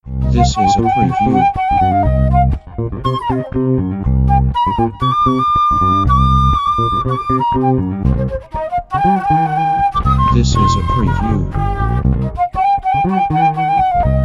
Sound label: Bass And Flute